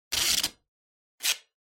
Duct Tape Pull Rip

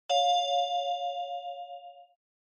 News Alert

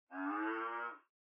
OS Sound (Speaker-Notification)

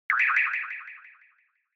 Short Accent Low Delay

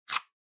PC-Hardware (SD Card-Eject)

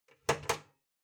Ceiling Light Pull

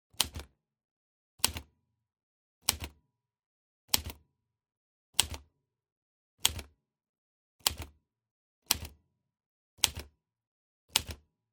Typewriter Type Single

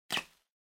Packaging Air Cushion Pop; 梱包用エアークッション; 弾ける; 梱包材

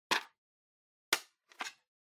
Portable CD Open Take Out

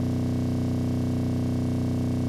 NES Racing Engine Loop

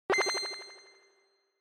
Inspiration, High Delay

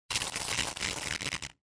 Horror Accent, Long

